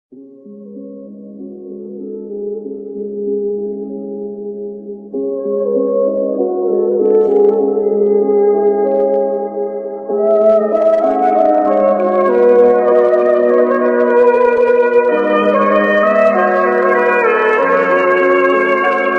broken heart ringtone love ringtone sad ringtone mood off ringtone